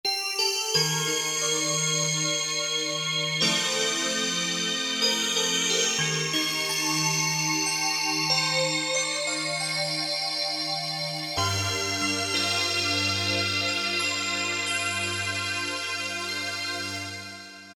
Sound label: Ice Pad; Generalmusic Equinox